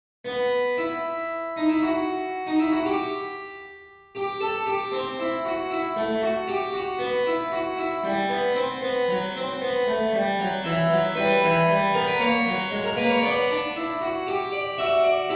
Fugue

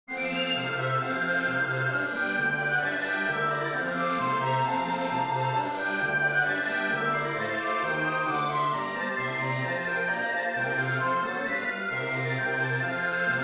Samba

